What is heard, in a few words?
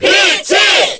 Cheer Korean; Peach; SSBB